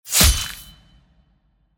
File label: slice